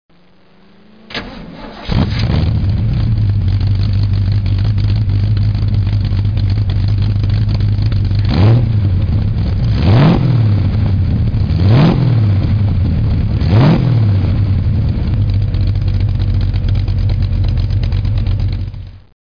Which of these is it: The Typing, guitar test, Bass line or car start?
car start